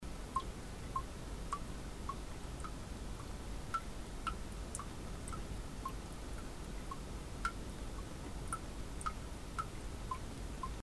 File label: First Droplets, Plumbing